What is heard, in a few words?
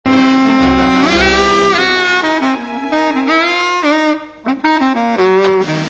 solo